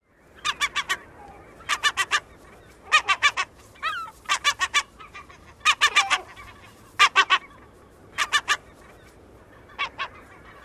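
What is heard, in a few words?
Herring Gull gagaga alarm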